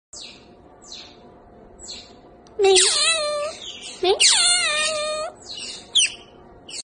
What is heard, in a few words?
Eurasian otter; شنگ اوراسیایی